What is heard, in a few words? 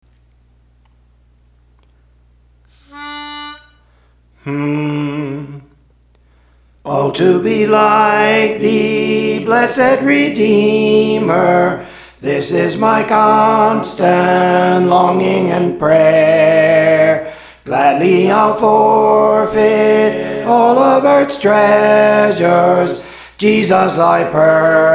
Soprano, Songs; O to Be Like Thee; Praise For The Lord Training